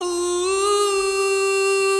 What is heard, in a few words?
oooo